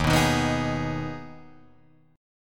Eb Suspended 4th Sharp 5th, Guitar Drop D; Ebsus4#5 strummed; 1,2,1,4,4,4; JGuitar

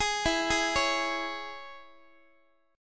E 6th, down, Guitar; arpeggiated; x,x,11,14,12,12

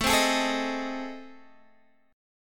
A Minor Major 7th Flat 5th, Guitar Half Step Down; AmM7b5

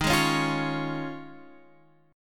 Db Major Eb

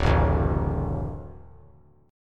D Major D down, D Chord; D strummed; JGuitar

